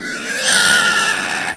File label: pig die; dn3d r; pigcop